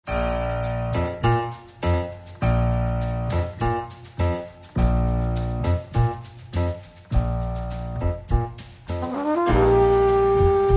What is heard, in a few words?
piano live